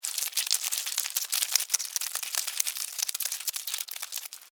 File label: Cockroach Run, Cockroach, Run, Scurry, Movement, Dirt, Insect, Bug; Cockroaches; Sound Effects:Animals:Insects; Blastwave